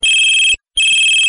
free short ring message tone ringtone, sms ringtones